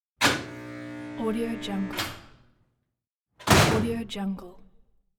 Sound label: jail door; moonaudioproduction